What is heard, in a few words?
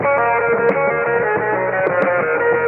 guitar, Jazzmatazz Loops